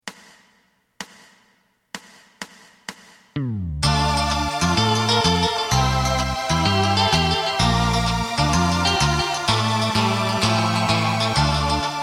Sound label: drum